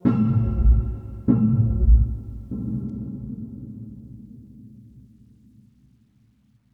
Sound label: String Mystery; Inquisitor 3